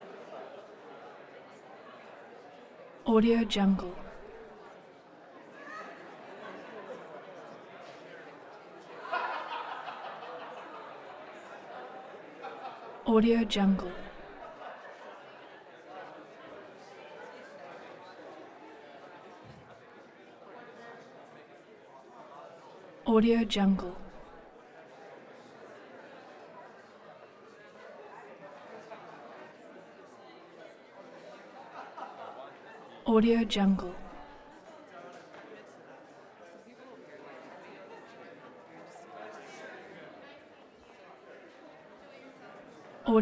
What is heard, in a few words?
rich white people walla interior reception